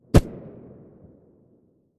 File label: glock17 silencer mono far